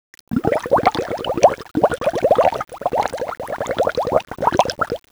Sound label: BUBBLES